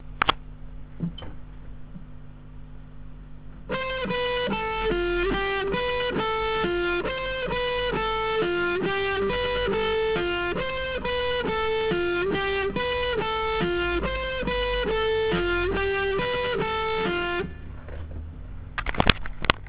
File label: alternate picking